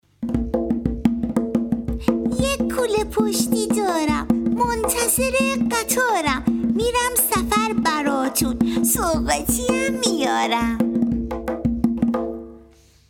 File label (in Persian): قطار